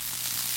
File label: Energy Ball